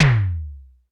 Roland U220 Dry Set; 90 s Sample CDs; 300 Drum Machines

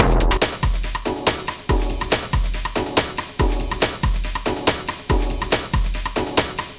beat crankin; Sonic Foundry (Sony Creative Software); Ugly Remnants VOL-2

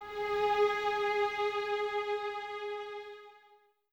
VNS G#4, Violins; Miroslav Vitous; String Ensembles

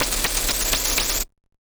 Casio, Glitched, malfunctioning synth

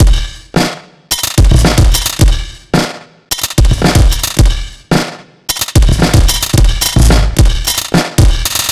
Elongated Beat, Electronic Beats; KJ Sawka; Drum n Bass & Beyond

